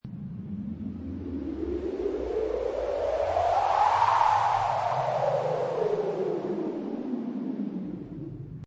Wind Dry Desert Or Space Loop Short, Dry desert wind, or space wind.; Weather, wind; Seamlessly looping sound, short version; Wind Sound Effects